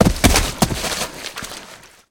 mud fall; firefight

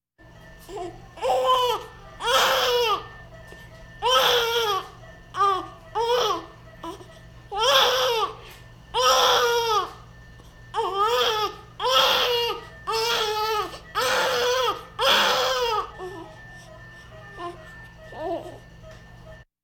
Hospital effects

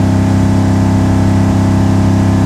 Truck Dakota Loop; Trucks

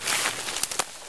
grass step, footsteps

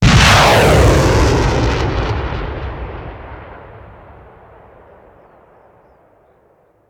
Missile Launcher, Explosive Blast; gun and weapon sounds; captain audio; fully loaded

